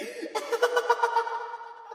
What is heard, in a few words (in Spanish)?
risa; Barotrauma; Barotraumatic; Clowns; ClownWanderer